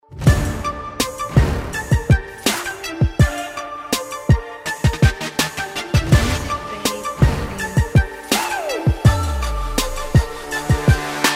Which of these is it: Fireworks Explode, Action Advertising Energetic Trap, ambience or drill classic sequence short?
Action Advertising Energetic Trap